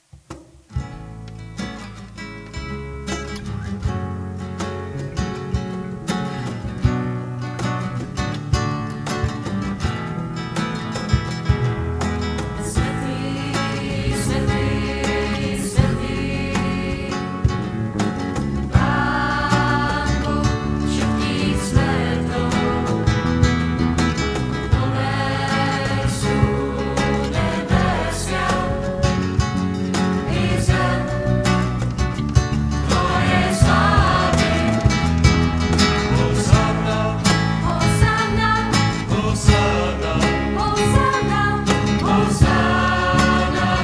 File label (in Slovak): Piatkova omsa - Hosana